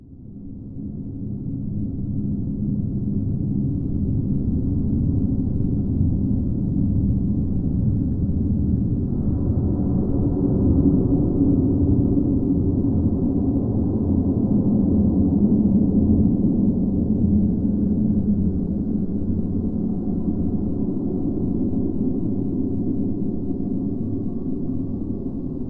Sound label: City Ambient; beltion beyond ritual